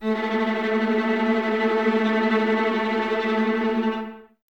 VLS A Tremolo, Violas, String Ensembles; Miroslav Vitous

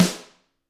GRETSCH SNR; ILIO; Double Platinum Drums 1

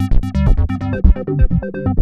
PENI PULSR, SYNTHS; Pro-Rec - Synthsonic

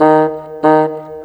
Bassoon, Orchestral, Rock Pop; Sonic Foundry (Sony Creative Software); Orchestral Series VOL-4; Rock and Pop; Rock-Pop 04

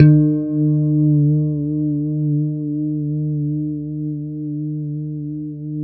E3 pick harmonics, guitars; Spectrasonics; Hans Zimmer Guitars VOL-1; JC-HARMONICS

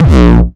909 Grime Layer 2 - A#1, Drums, Kicks; Mbase 11 Kicks

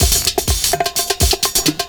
PERCS, 70 s Breakbeats

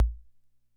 Kick, rhythm 55

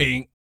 BASS FX; ILIO; Vocal Planet VOL-3; Jazz & FX

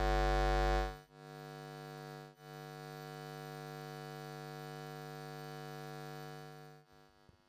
Electromagnetic Field, Electro; electric fields

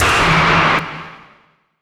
NOISE

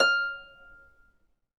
F5 HARP; Complete Harps; USB Soundscan vol.62; AKAI

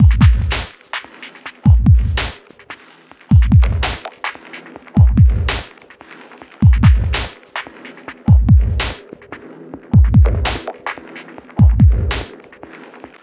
Squashy Machine, Drums & Percussion, Electronic; Sonic Foundry (Sony Creative Software) - Electronic Point Blank

